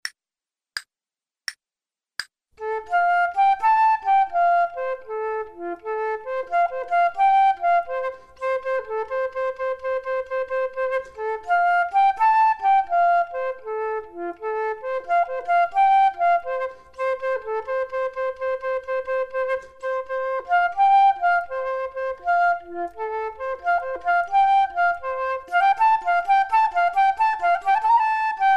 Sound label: Duets flute; Selected Duets for Flute Podcast